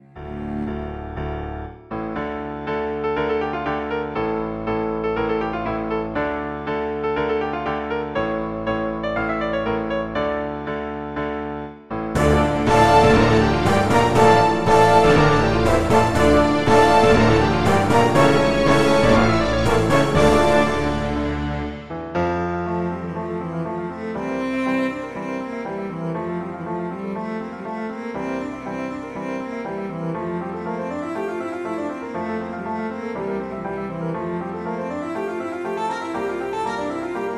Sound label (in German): Konzert für Cello und Klavier